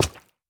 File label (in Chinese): 珊瑚挖掘声